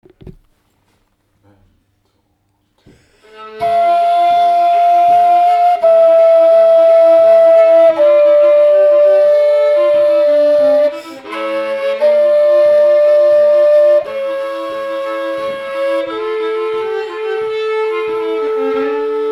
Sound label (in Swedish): Blåsstämma under